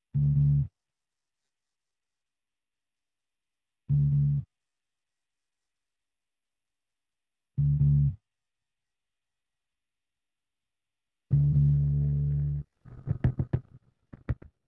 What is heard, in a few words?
bass